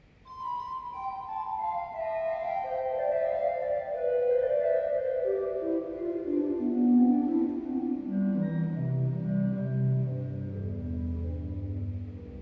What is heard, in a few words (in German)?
rohrfloete 8, Hauptwerk, Rohrflöte 8'; kirchenmusik; pfeifen; gereon koeln